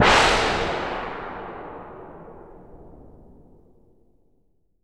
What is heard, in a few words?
SHEET THUND; Rarefaction; Poke in The Ear With A Sharp Stick